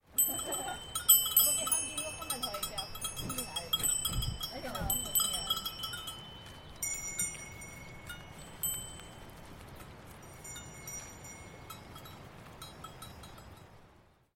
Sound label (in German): Ziegenglocken im Valser Tal